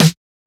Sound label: Snare; FLPRO